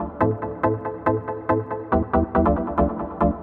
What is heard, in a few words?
TECHNO SYNTH 140; Keys